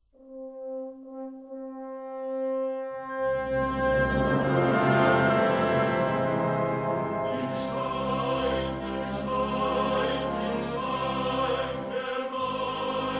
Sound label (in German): hymne